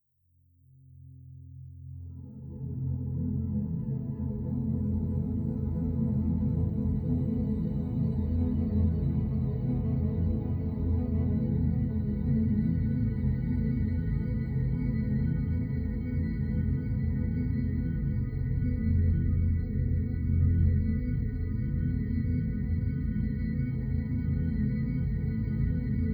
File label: Shakuhachi